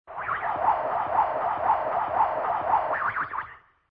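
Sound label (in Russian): завихрение длинное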